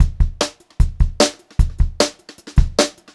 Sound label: BEAT, Brushed Drum Loops